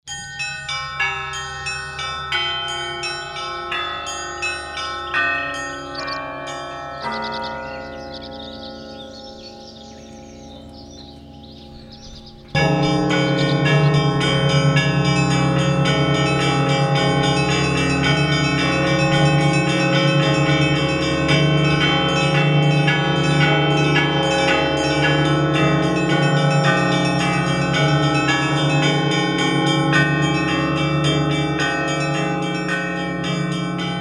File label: perezvon